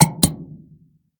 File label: Push Button